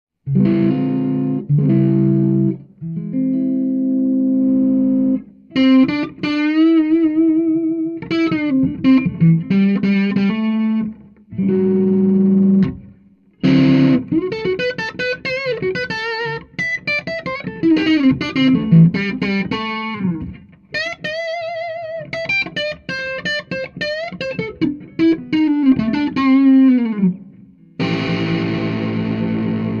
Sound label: hydra crunch fat sw on